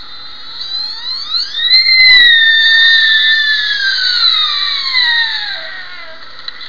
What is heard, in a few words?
sika buck; Sika Deer Buck